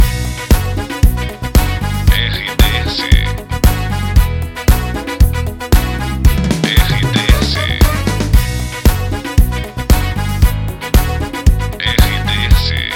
TRILHA PARA LOCUCAO RETRO; Itapoan

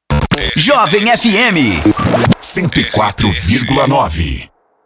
passagem; Jovem FM

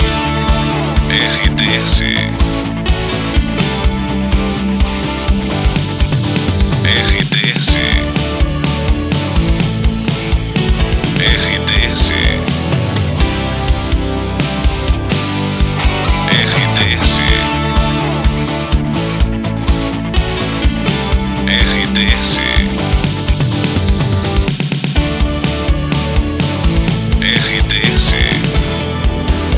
trilha locução; Pacote 92 FM, Timbó/SC. Pacote com característica pop rock

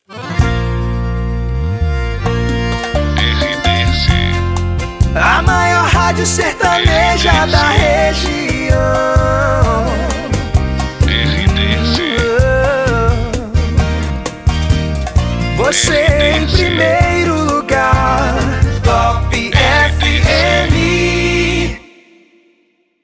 saída de bloco; Top FM

